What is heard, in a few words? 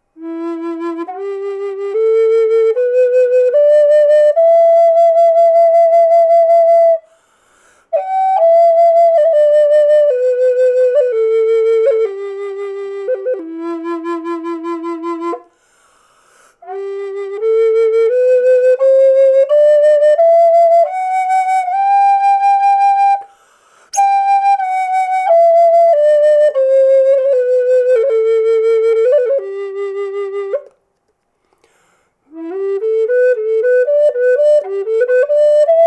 native american flute camphor burl e m